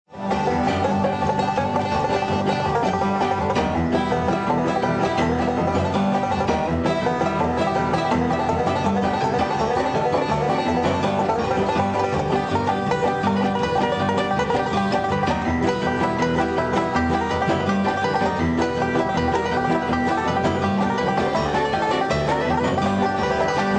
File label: banjo